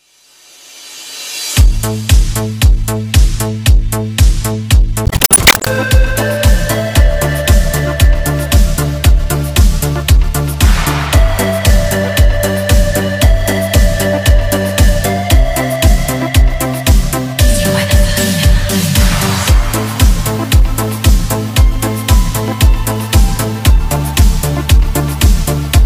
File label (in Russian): клубная музыка; классный клубняк; музыка в машину